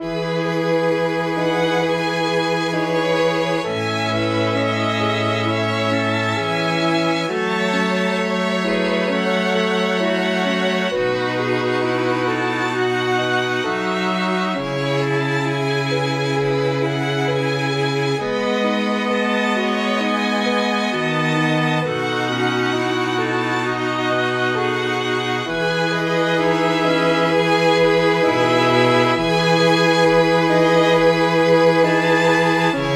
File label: garden meditation